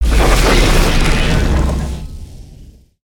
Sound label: Ice Tornado Start; Spinjitsu